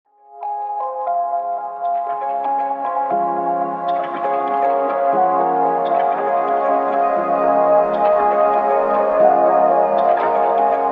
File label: Ambient